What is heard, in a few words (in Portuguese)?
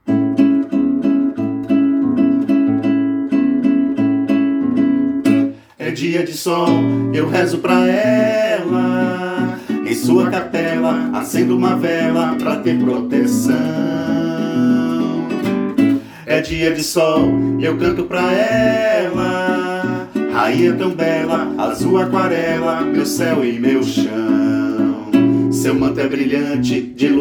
samba